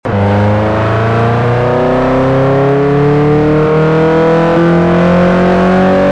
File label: second, vehicles; gmod; tdmcars; spark